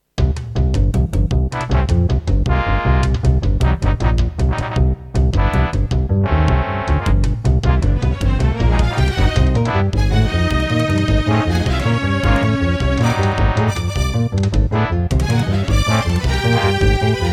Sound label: Horror, music